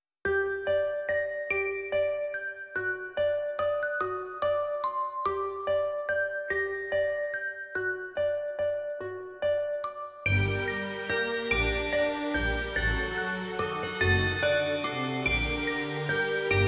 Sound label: dreams bg; spaghetti